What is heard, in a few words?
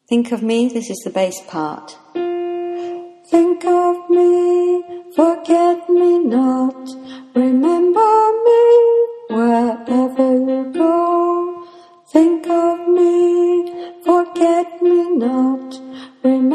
bass, choir